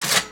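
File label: cash register close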